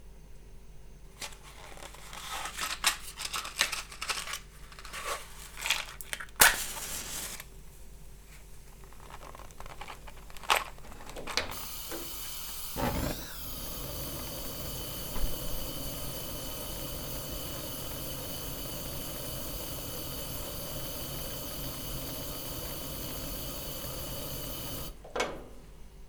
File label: Kitchen lighting the gas with match